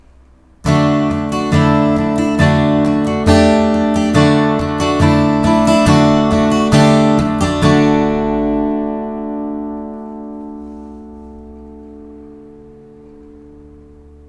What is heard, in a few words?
Suspended chord example